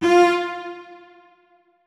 notes, keyboard; Hideout Furniture

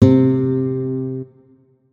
notes, guitar; Hideout Furniture

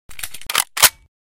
unjam, weapons; MP7; MP7 Reanimation; librarian mp7

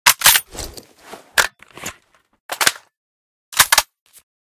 reload empty, weapons sv98; SV98 TRG42 Reanimation; librarian trg42

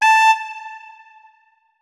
notes, saxophone; Hideout Furniture

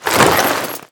tac gear, gear rustle; 1st Person Visible Body

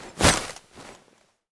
axe swing, weapons; Barrys Better Axes

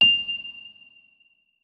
notes, keyboard, electric piano

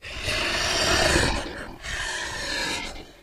idle, monsters bloodsucker; Soundscape Overhaul

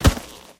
default, human step; Footsies

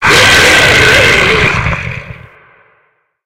attack hit, monsters, lurker; Soundscape Overhaul

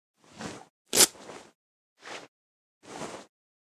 patch remove, outfit; Outfit Animations

